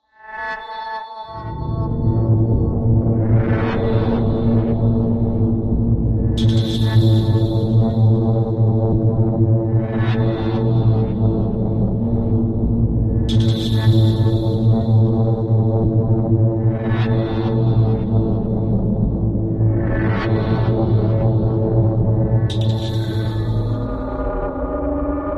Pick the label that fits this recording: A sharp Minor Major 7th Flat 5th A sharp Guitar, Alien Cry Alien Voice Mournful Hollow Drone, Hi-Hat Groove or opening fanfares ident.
Alien Cry Alien Voice Mournful Hollow Drone